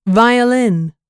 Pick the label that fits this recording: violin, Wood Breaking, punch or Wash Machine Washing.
violin